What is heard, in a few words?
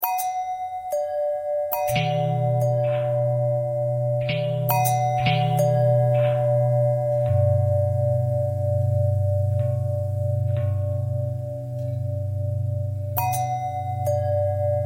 long bell long bell thread pentatonic, bells